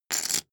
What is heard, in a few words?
poker chip stack rattle